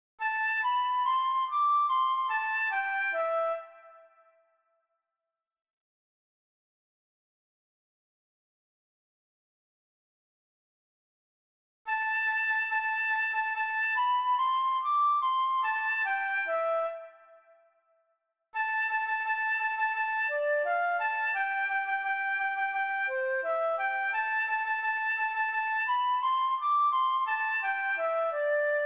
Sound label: Drunken sailor Flute